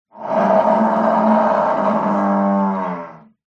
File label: animals buffalo grunt growl